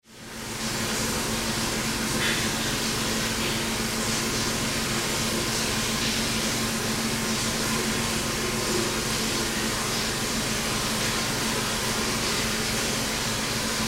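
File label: Locker Room Ambience, Locker Room Shower Run Fan; Miscellaneous Sports; SoundEffects-Blastwave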